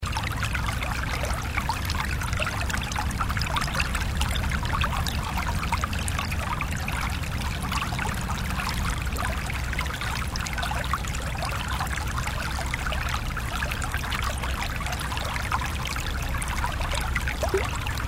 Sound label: babbling brook; arboretum